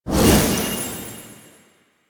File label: Horse Racing Portal Touch; Duviri